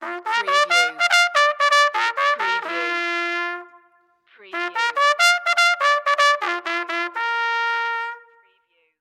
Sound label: Trumpet Charge Horse